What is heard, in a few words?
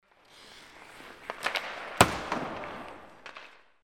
hockey puck slapshot